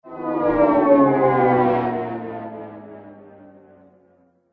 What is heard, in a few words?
Engine Hum Spaceship; Spacecraft Sound Effects